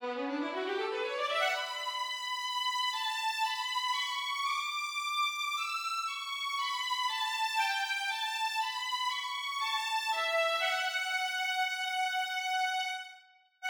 strings high; suikoden; violin

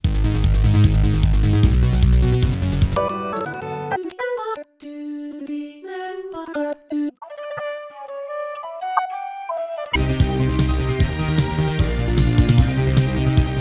Stage Music, midi

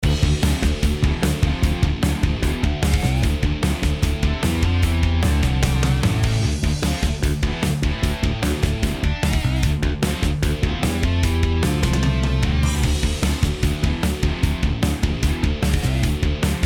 riff

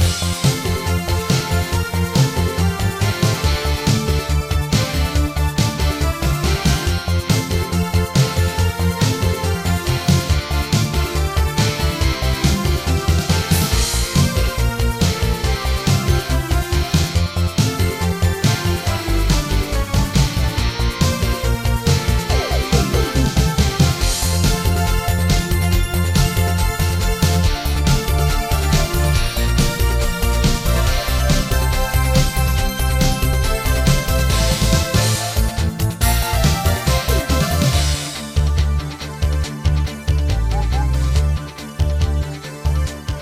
cave; msx; goonies

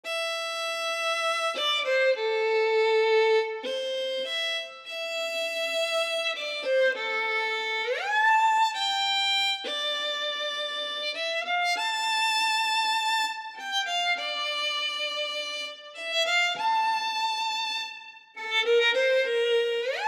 violin

